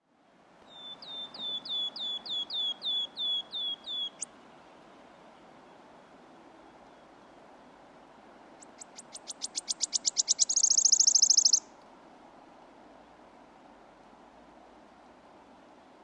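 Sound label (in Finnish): sirittäjä, lintuäänet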